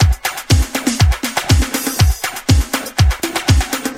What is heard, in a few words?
121 bpm drum groove b key